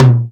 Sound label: natural tom single hit b key